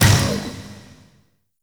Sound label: Keyglyph Already Taken; Warframe; Entrati; Vaults